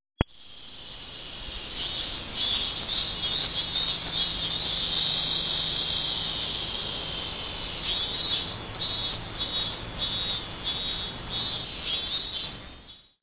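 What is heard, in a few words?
minmin cicada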